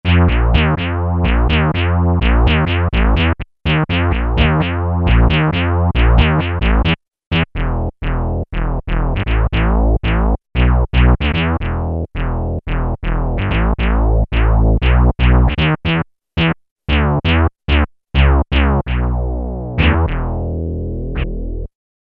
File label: House Bass, Peavey DPM Spectrum Bass